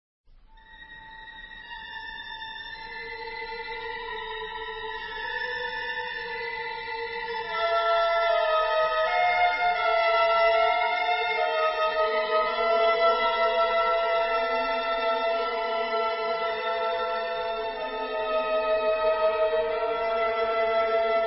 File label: expressive suspense